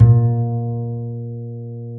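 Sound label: double bass A#1, Jazzistic; Univers Sons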